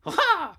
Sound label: rage